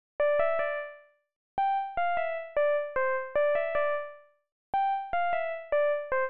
BELLS; bombogroove

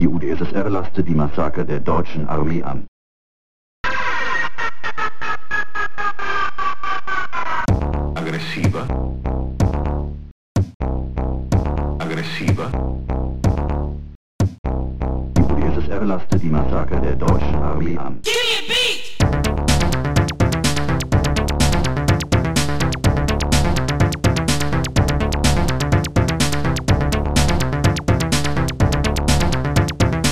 BOMB MIX

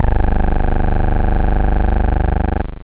terror, synth

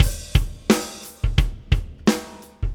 Straight Rock 86bpm, Drums; ACID MUSIC

